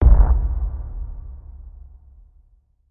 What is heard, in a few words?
Bass Impact Low Drum; impacts